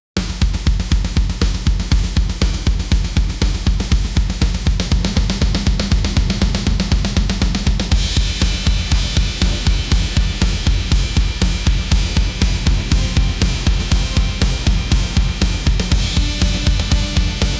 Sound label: rock music playing, fight